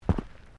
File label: Footsteps Boots Walking Track Sandy Step; Footstep Foley; Human